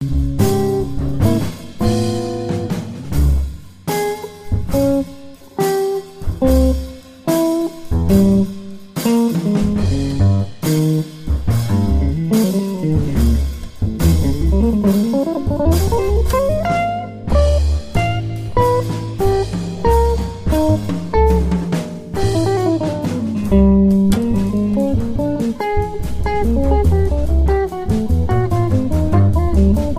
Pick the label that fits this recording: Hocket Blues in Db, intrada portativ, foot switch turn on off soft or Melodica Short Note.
Hocket Blues in Db